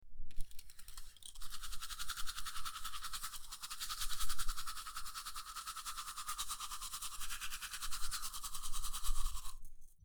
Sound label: brushing teeth